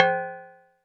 Cowbell

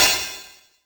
Ride Cymbal

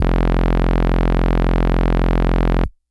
Hard Brass DX100 F1

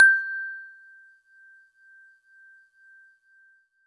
Celeste DX100 G-1; Pristine Celeste

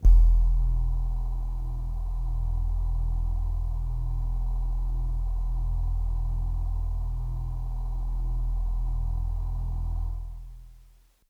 Bell Air Kawaii E2

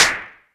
Clap; TRX

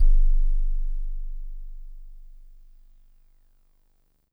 DreamLike DX100 G -1

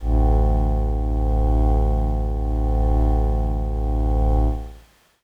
Glass Howl Kawaii D1; pads

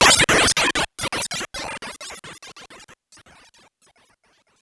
Sine FX

